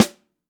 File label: snare